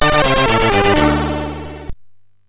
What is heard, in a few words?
Intro Music, sid MOS6581